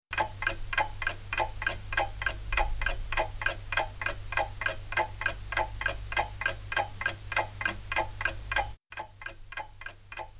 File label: noise